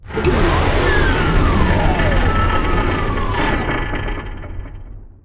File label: rocket, ships movement